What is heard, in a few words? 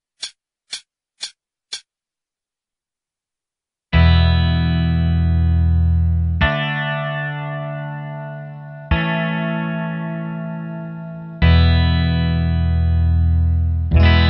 intro backing 48